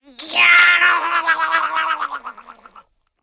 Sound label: death; awesomenauts